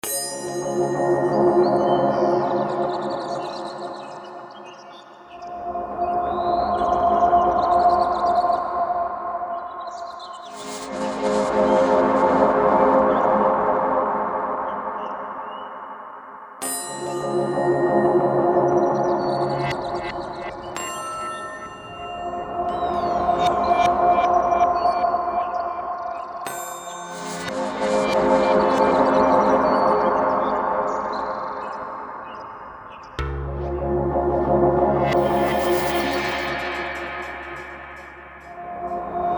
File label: Wondering Soundscape